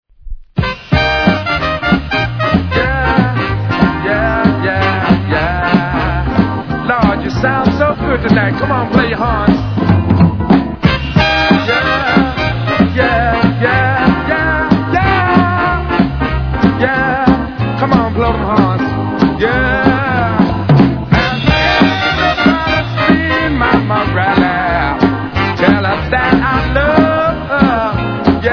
jazz

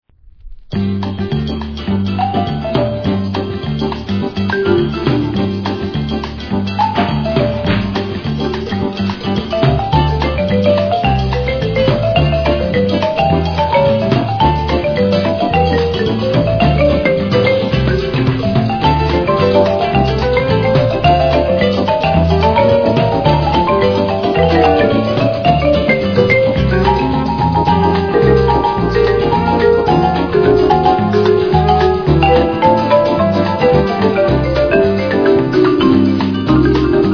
marimba; ill marimba you

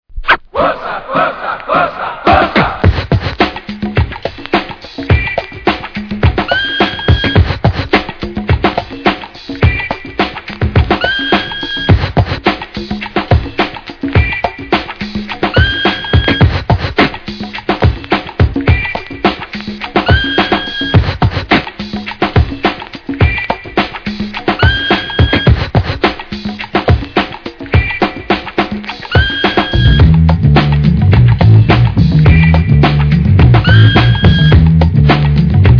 beats

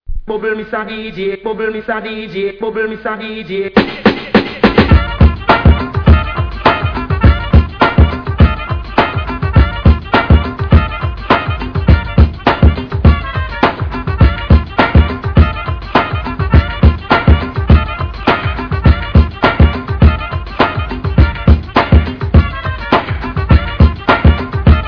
beats

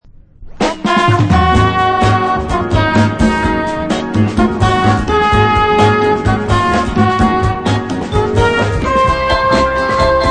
bossa

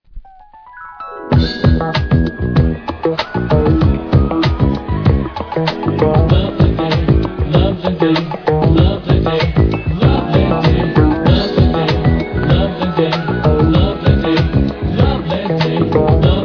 jazz

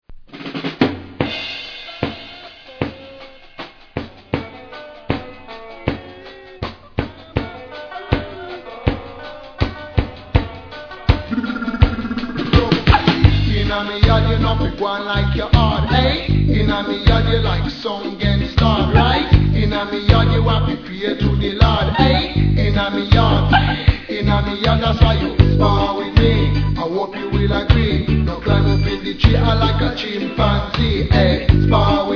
beat

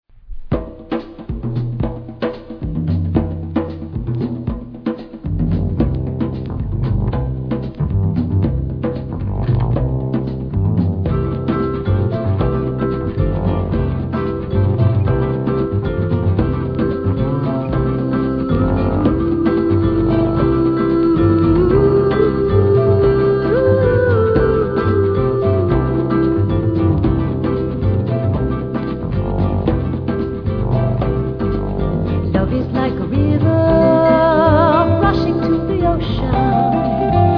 jazz

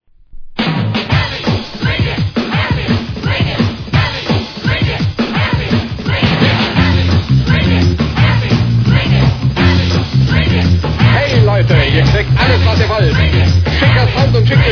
pop

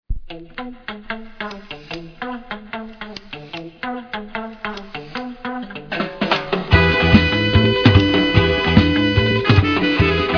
beat

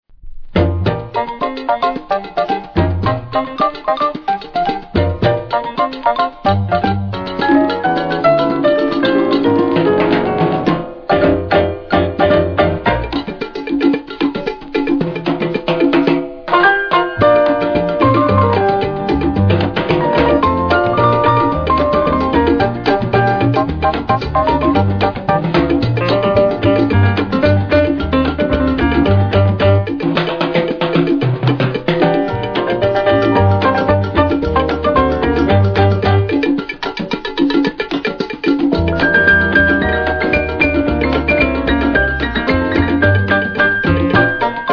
piano

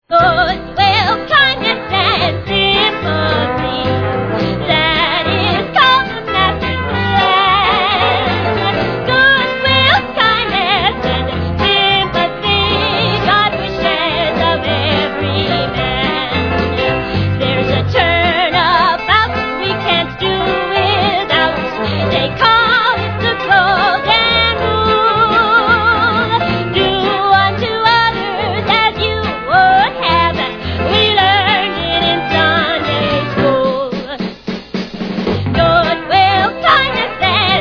jazz

